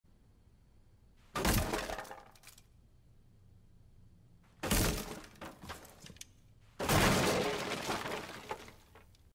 door break

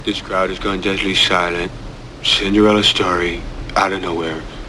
crowd silent; cinderella

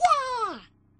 yay; roblox

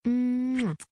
kiss

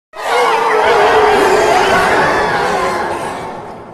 horde sound effect

